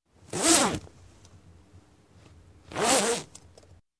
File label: Closing bag zippers